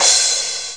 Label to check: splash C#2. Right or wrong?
Right